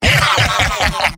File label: demoman laugh evil; tf2; garrysmod; mvm